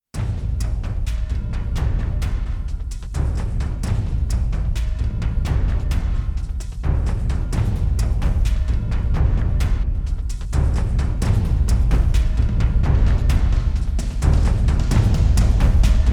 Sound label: drums